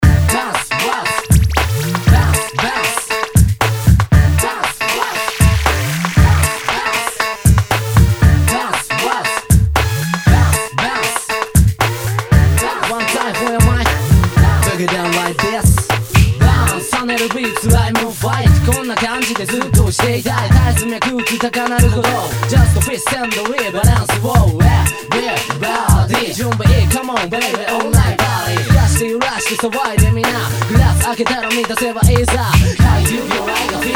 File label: Bounce Words Warp